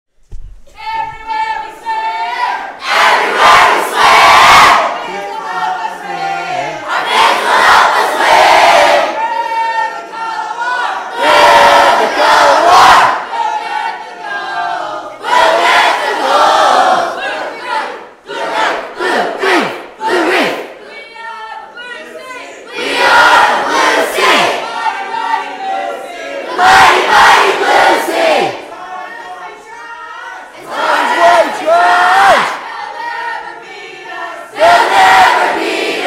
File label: Cheer